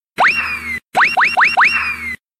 cartoon fail punch

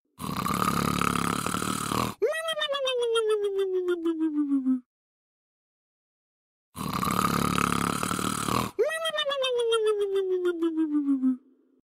funny snoring sound effect

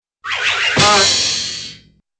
whirly twirl and fall

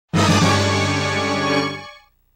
surprised

